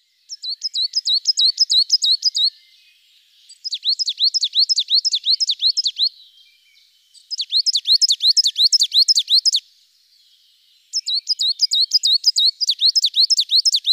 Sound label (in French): Mesange noire